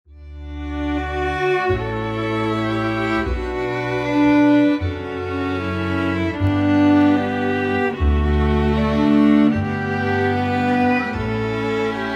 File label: allegro canon; pachelbel